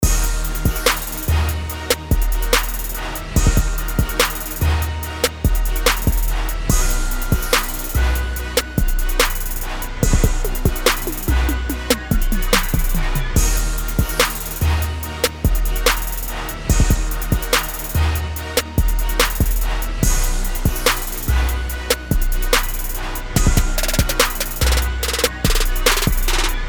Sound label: Trap